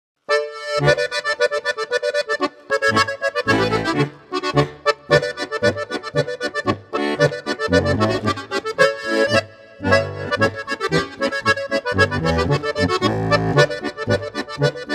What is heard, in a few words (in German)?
2 Chörig Flach ADGC